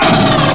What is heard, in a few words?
uzi